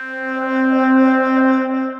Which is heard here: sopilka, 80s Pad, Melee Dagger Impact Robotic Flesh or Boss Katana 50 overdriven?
80s Pad